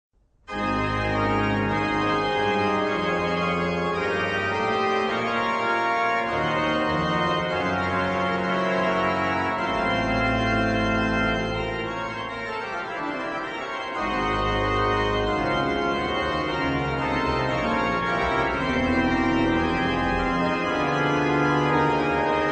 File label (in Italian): organo; Clasica